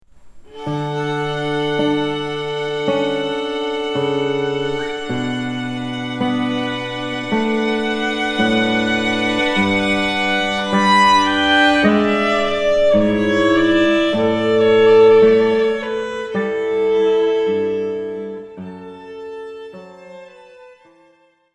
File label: Air from Orchestral Suite; Bach